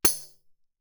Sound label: tambourine sforzando; Percussion